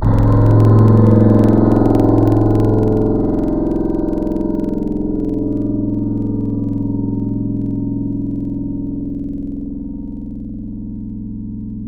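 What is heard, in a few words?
guitar Shamisen, Wavetables; Synthetic's Serum Collection; Standalone-Music SYMPHONY Wavetables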